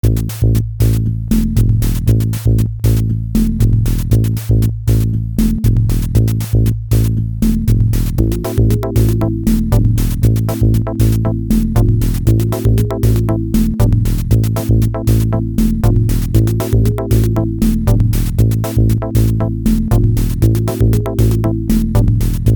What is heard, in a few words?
Castle Ruins; Project Toten Eisenritter; Wolf 3D mod